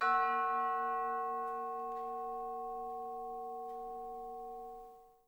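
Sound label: closed hi hat F#4, Percussion; Miroslav Vitous - Percussion & Harp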